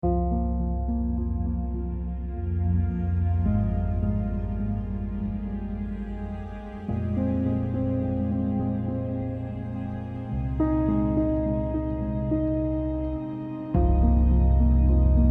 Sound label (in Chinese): 剧情叙事, 宝藏背景音乐